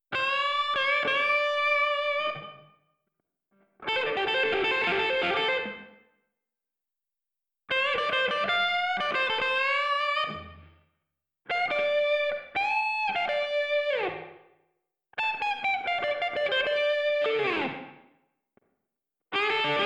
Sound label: ISOLATED lead guitar alternative